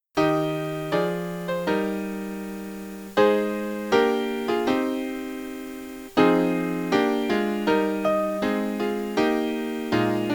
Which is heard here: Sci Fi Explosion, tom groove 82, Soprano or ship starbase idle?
Soprano